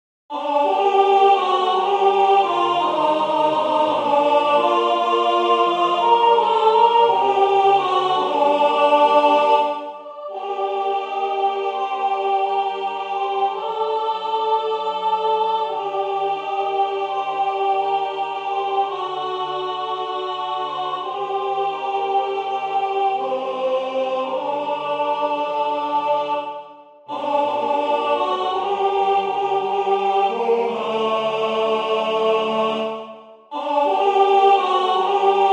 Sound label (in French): O sainte Jeanne de Domremy alto; anonyme